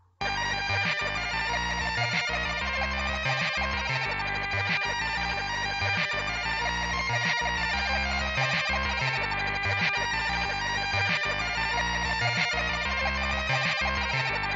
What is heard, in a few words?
bit tune